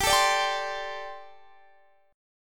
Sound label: G Suspended 2nd Suspended 4th G, Guitar, Drop D